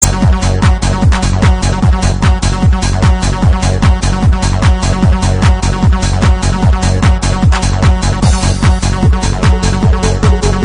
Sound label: dance